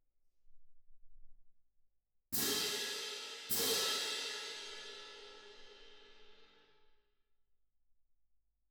Perc

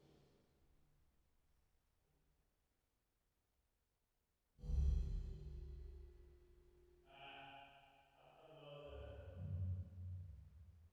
480 Rev

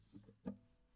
GTR

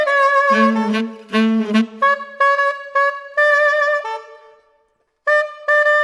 Sax

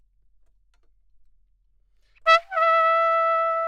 Trumpet

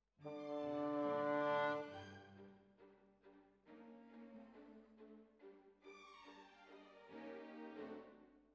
Bassoon, Jazz for piano

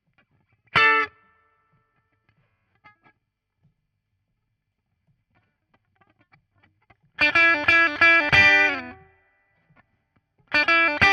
Guit Solo, RNR for piano

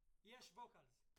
Ride

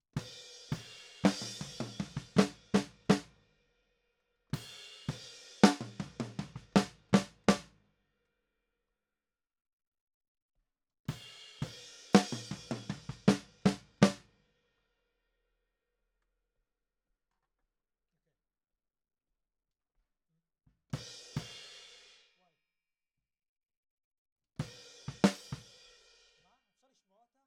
Snare

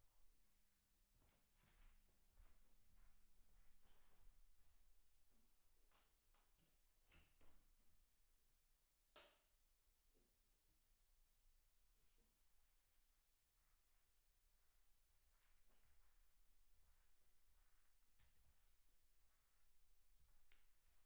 PERC OH

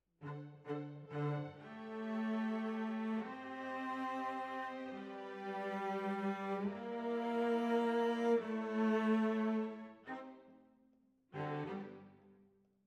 Cellos

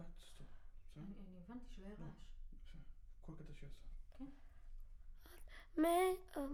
Children Choir; Studio Bekol Children Choir

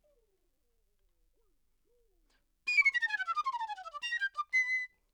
Flute

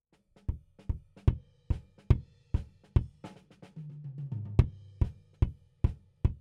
Kick In

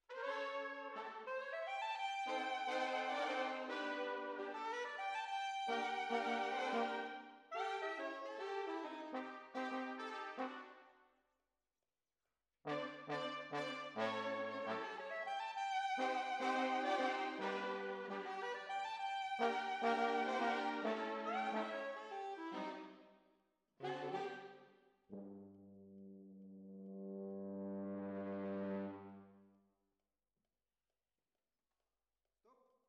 Trombones, jazz for piano

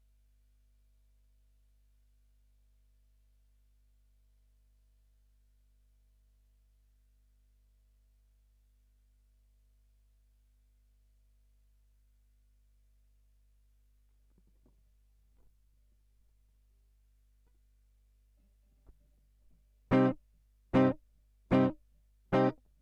Gtr Line

